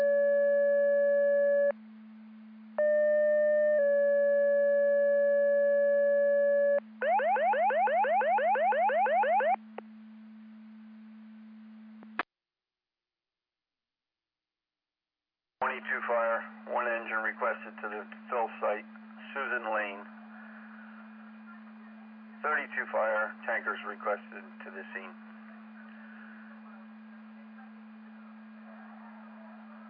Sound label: Siren; Andover Twp Boro